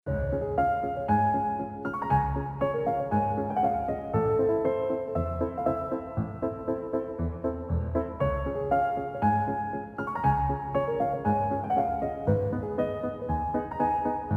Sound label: Piano; Luigi's Mansion 3; The Great Stage (4) - Amadeus Wolfgeist Piano 2